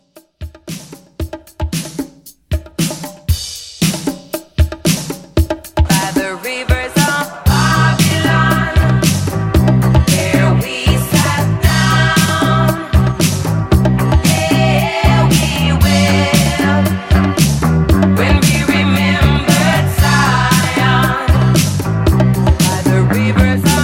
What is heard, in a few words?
70s Re Drum 115